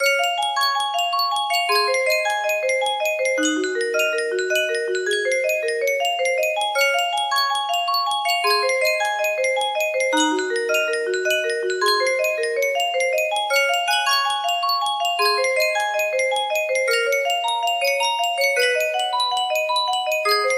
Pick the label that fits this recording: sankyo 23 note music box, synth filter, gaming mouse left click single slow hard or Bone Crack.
sankyo 23 note music box